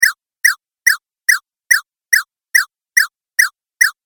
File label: Arcade Game Chirp Sequence; Video Game Sound Effects; Retro Inspired Sound Effects - Think Nintendo, Atari, C64, Etc.